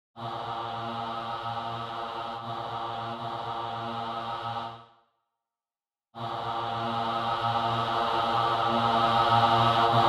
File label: Bass; Requiem